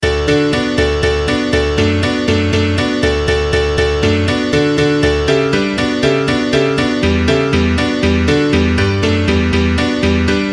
Feedback Diatonic

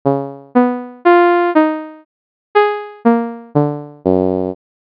Envelope Decay

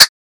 noise burst

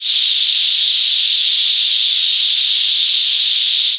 noise fricative

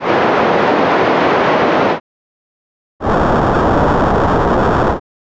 noise filtered vs modulated

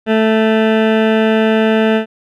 Waveform Rectangle

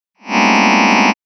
cluster closed semi H

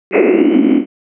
cluster arpeg quarter eh ee pink

